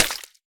泥巴 破坏声

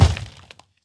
僵尸疣猪兽脚步声

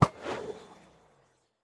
末影之眼发射声

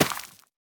收获作物声

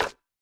树脂砖放置声

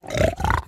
猪灵蛮兵待机声

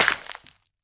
可疑的沙砾破坏声

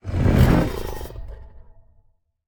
监守者愤怒聆听声

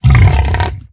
愤怒声; 疣猪兽

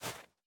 细雪破坏声

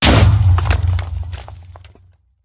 爆炸声

